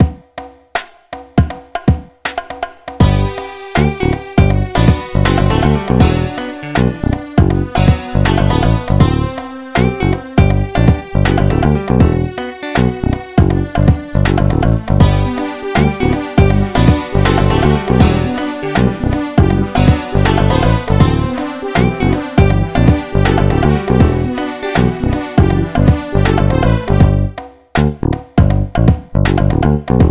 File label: Jungle; bfdi branches